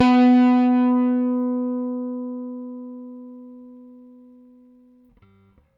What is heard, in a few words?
Rick Thumb, Rickenbacker Bass